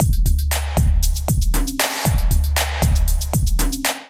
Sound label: One Hit Kit groove 117 bpm; MIDI Clips; Ableton; Factory Packs; Drum Essentials